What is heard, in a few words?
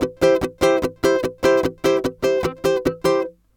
Ska Fast